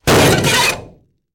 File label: Bullet Impacts Metal